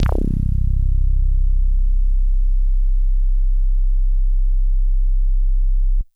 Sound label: Synthe Bass DX100 C2